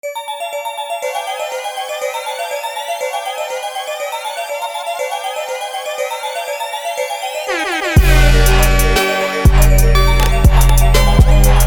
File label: trap